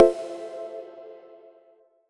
Menu Hit; megamix

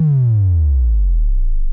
section fail; Mrekk (2.0) «NM»

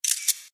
shutter; Bacon boi 1.0; blue

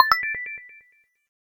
pause continue click; 『DTMoon』

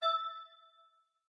pause retry click; cedru vax amas

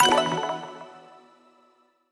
menu multiplayer click; 『Mikan』

